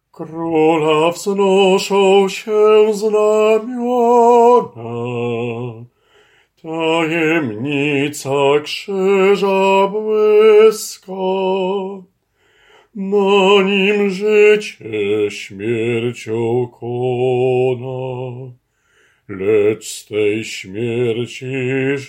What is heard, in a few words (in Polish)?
BAS; Krola wznosza sie znamiona